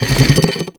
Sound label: Arm Drag; Enemies; Warframe; ManInTheWall